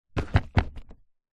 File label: musical violin in case set down on ground